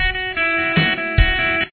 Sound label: verse guitar